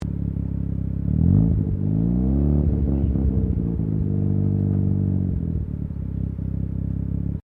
CAR MAZDA RX7 SERIES3 EXTERIOR EXHAUST IDLE THROTTLE UP